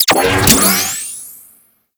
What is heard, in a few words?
Bomb Fully Charged; Missions; Raid; Grn Bomb Objective